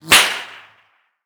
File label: bullet flyby rifle; Rust